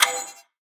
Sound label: Clock Tock; Avoid